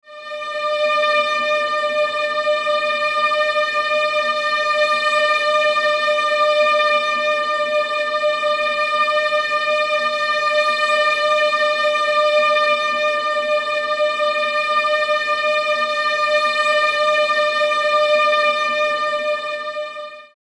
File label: High D